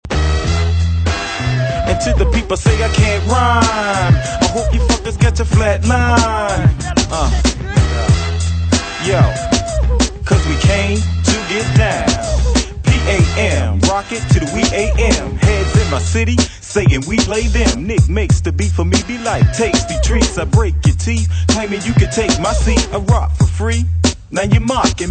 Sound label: intro rap refrain